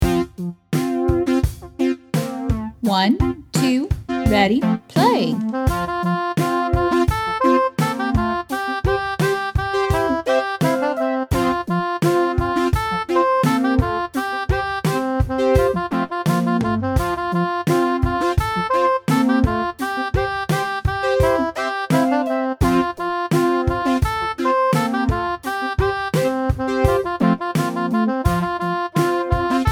SLOW Tempo, ukulele; Rainbow Ukulele

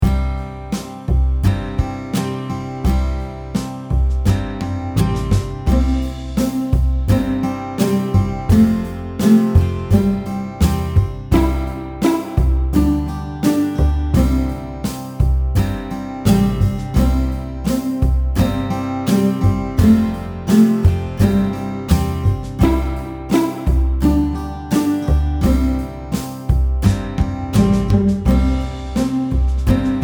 Old MacDonald SLOW Tempo, ukulele; Rainbow Ukulele Play-Along

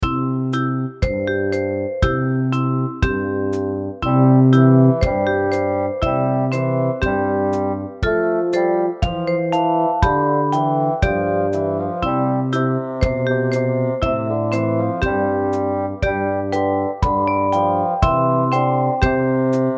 This Old Man Multi Verse SLOW Tempo, ukulele; Rainbow Ukulele Play-Along

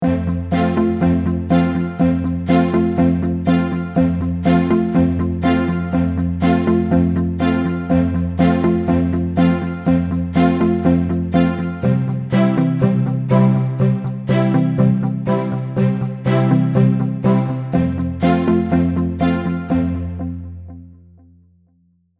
No Melody, ukulele; Rainbow Ukulele

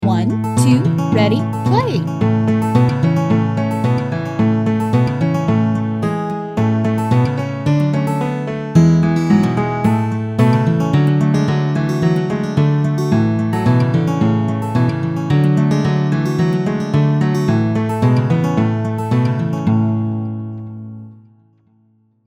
WITH INTRO, ukulele; Rainbow Ukulele

